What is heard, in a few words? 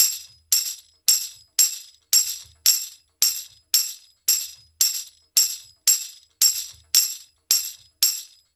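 percussion, Roots Funk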